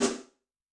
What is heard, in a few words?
Electric, Yamaha DTXpress-II snare